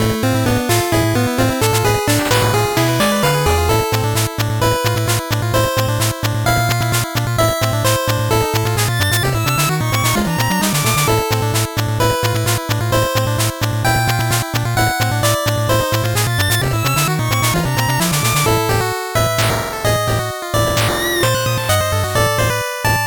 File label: 8bit bgm slow